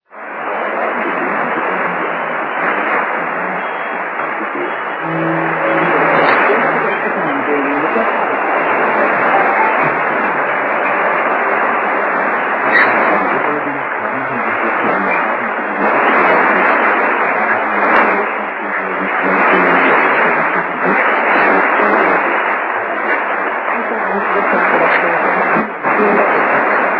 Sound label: 7265KHz; SWR